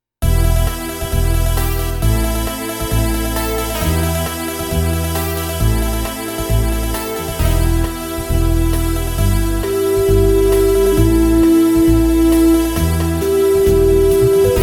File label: Rock Rhythm in Em Strong Strings Synth Lead 134